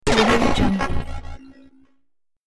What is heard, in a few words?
tech future noise